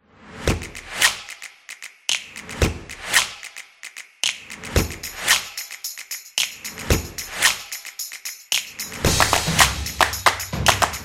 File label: A Percussion Promo